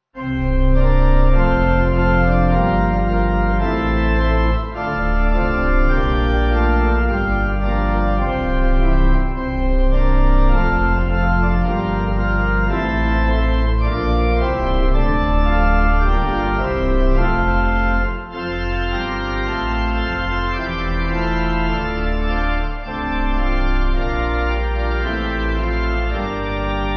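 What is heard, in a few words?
Pipe Organ, Music Backing Files for Churches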